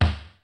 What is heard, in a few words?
kicks snare, kid; Kid Nepro; Elite; KICKS SNARES